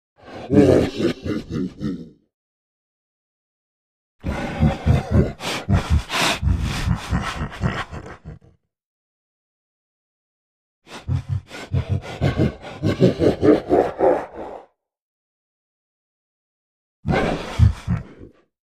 Beast Laughs Evil Mo, monsters

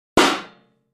Aluminum Pan Impact; barrels buckets & cans

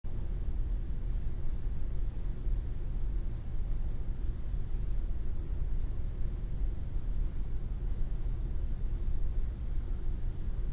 Large, Airy Room With Light Hum.

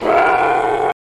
Puma Growl Loop; big cats

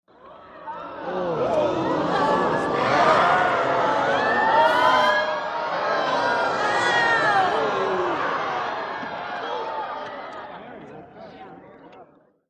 Theater Crowd Ooo, theater

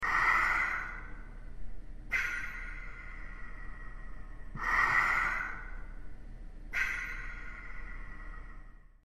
Respirator Pump

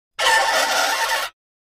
Metal Scrape On Metal

